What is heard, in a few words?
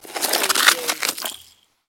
Snow and Ice, Ice and snow grate with ice particles